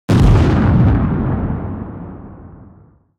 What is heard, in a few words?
Explosion shockwave impact large powerful